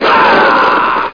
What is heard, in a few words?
magic hit; szenarion